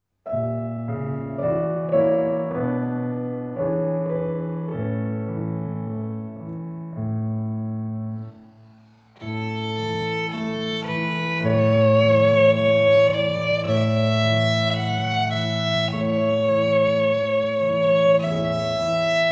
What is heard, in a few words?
Long Long Ago in A Major; Arr. S. Suzuki; 60 percent